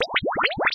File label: stick on bar; eiffelmedia; brick breaker